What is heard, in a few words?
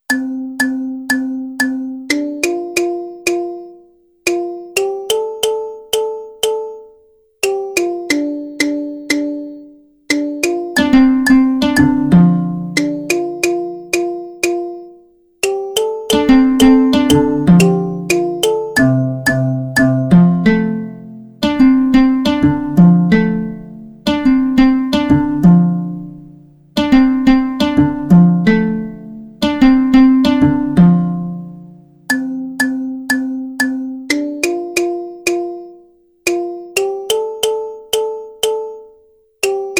african boy kalimba